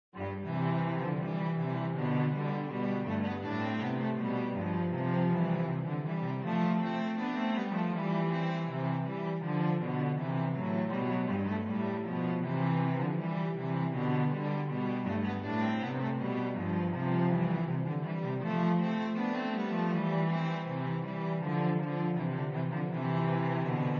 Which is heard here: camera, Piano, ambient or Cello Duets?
Cello Duets